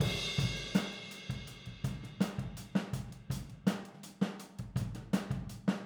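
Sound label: Boogaloo, Overhead Stereo, 82 BPM; Drums; Verse; Sonic Foundry; Drums from the Big Room The Mixes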